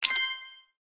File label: add gift; FishingFrenzy